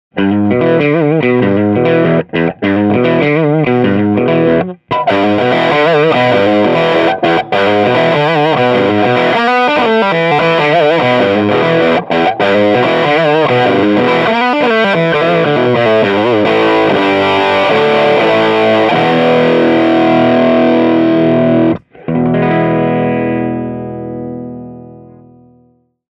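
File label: position, pedals; gboy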